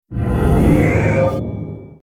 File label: taunt effect